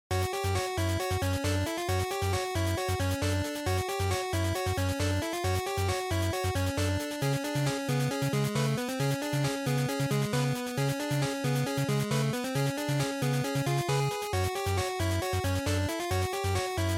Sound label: GB Action Boss